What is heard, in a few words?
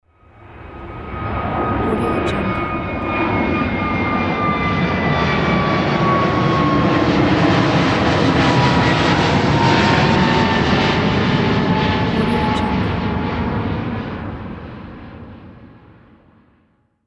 airplane flies past